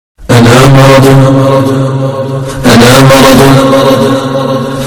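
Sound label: arab distorted, arab nokia ringtone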